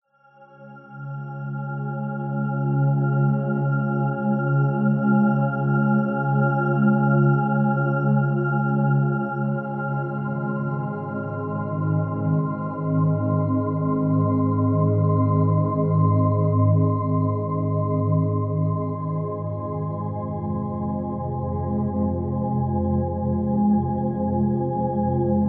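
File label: Meditate, Meditation background music with soft ambient pads and peaceful sound textures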